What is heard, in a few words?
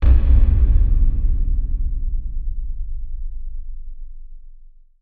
Bass Impact Low Drum; impacts